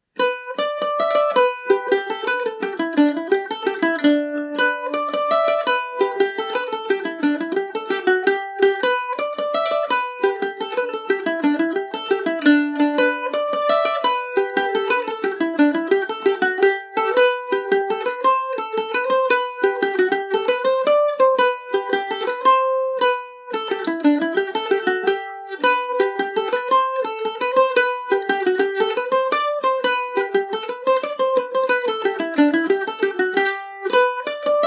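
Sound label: jig, G major, irish mandolin; Adam And Eve (Jig); G&O #34 mandolin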